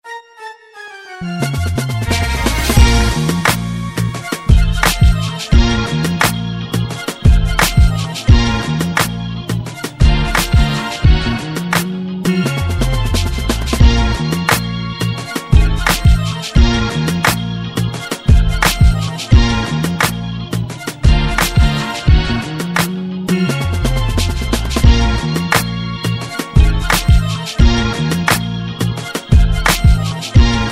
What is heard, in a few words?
Amusement Park (Instrumental)